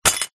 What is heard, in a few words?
broken picture frame containing loose glass shards place down; zapsplat